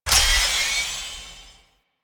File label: Lantern Power Up; Warframe; Emissary Season Two